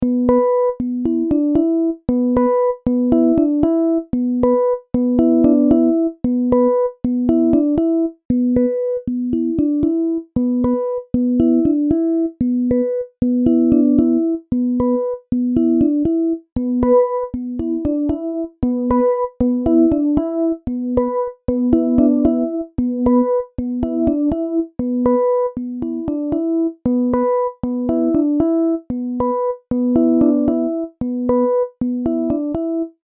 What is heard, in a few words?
Mod fx first normal